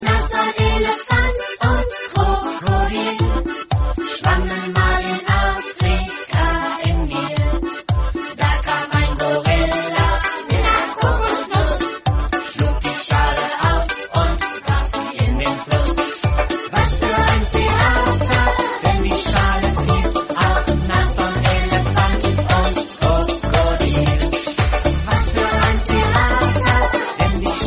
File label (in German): Disco Remix